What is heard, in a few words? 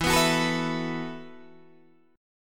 E Suspended 2nd Suspended 4th E, Guitar, Open D; 14,14,16,15,14,14